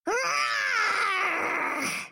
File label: rawr like this